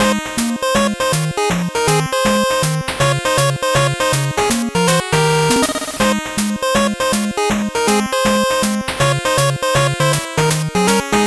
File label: 8bit bgm normal